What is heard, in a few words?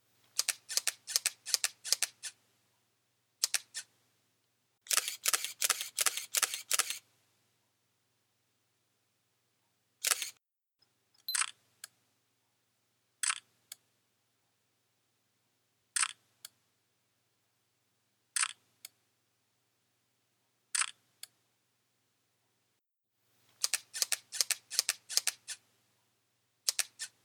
shutter noises